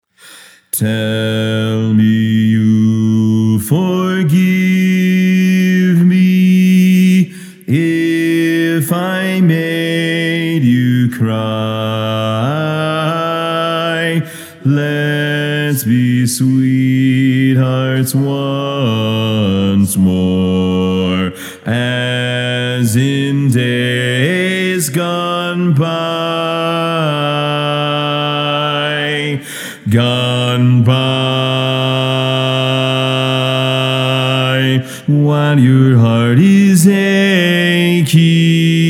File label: Bass